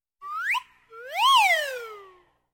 Funny cartoon flip sound effect

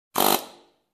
Wood Drilling

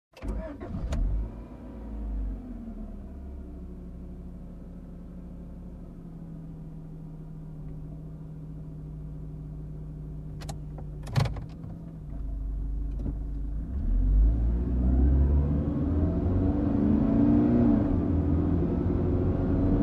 Driving fast in sports car

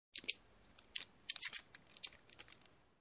Handcuffs clicking

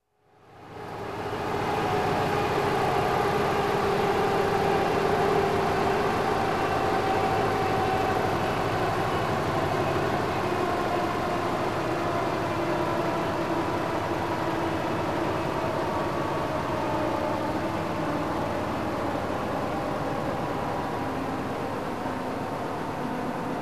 Industrial Machine gradually running low and high

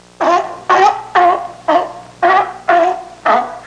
Continuous seal barking

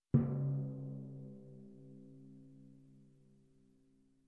Timpani Single Soft Hit

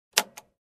Aircraft Overhead Bin lock click sound

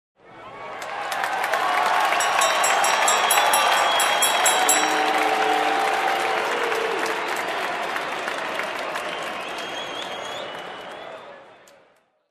Crowd Cheers and Boos with Glass clinking

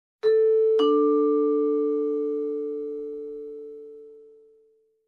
Vibraphone simple doorbell melody

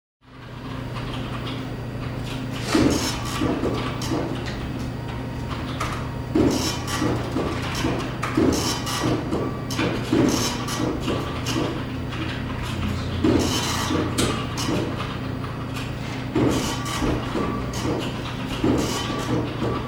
People working in noisy Office

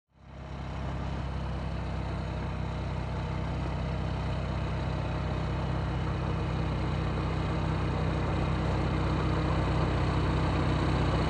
Truck in a construction site approaching

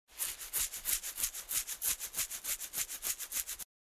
Cleaning using mini brush

